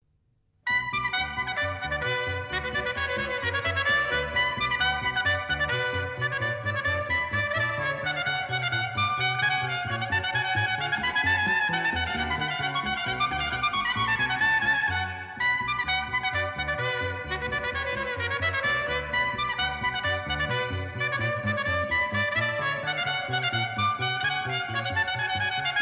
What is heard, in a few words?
Trompette; Badinerie Pop